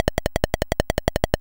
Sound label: YM Perc, DRUMS; ChipShop Deluxe; ChipShop Hits